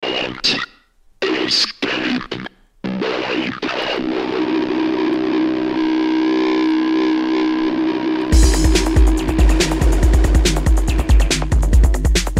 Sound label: Break